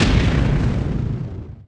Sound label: small explosion; Warzone